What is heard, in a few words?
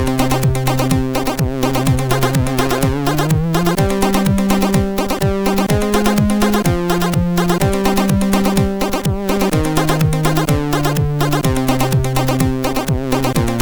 Light Voices intro, MOS6581R4; Griff; hvsc